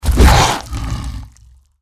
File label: deathclaw strike; npc